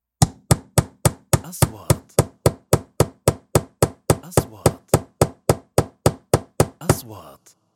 Wood

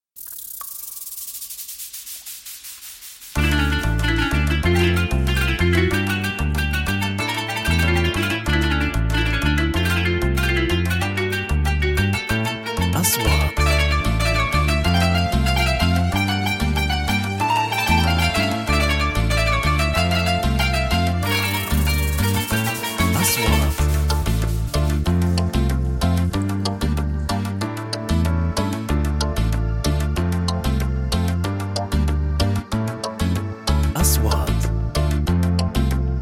music chords

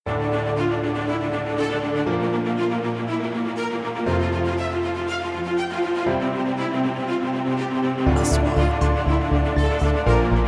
corporate

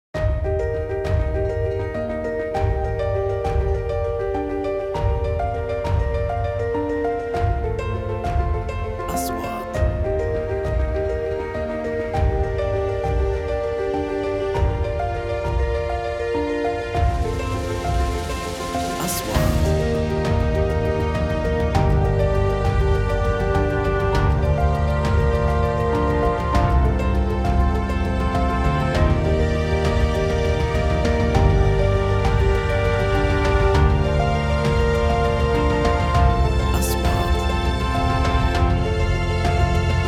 corporate

